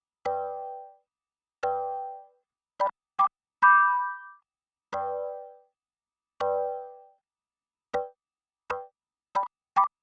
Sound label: yamaha yc61 test, stage keyboard